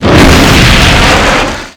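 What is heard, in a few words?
fire vehicle flame up medium big; Explosions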